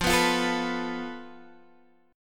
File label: F Major Flat 5th Guitar Standard; strummed; x,8,9,10,10,7; JGuitar